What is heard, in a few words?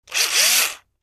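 Electric Drill Small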